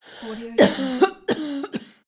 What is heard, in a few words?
the female coughing